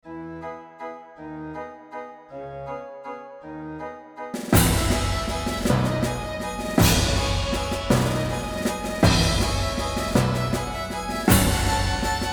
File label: The Wedding Waltz - full percussion version; FFVI